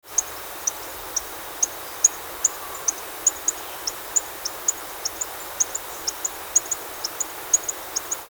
Eleuthe jaumei, amphibia

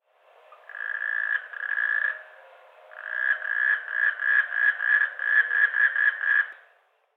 D melanostictus; long; cuba

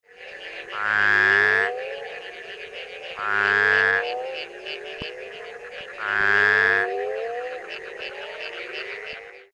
Diaglena spatulata, amphibia

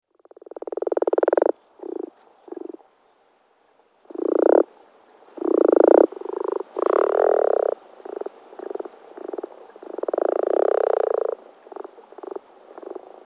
Rana Temporaria, amphibia

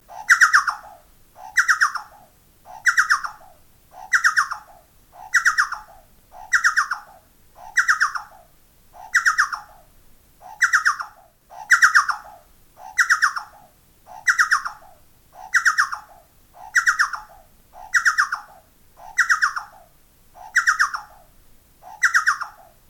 Chucao; Scelorchilus rubecula

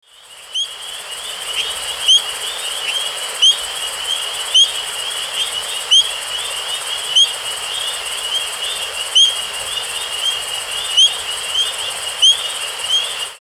Boophis Sibilans, amphibia

